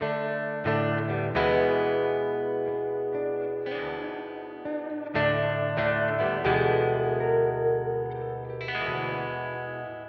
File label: Spring Reverb Source Audio Ventris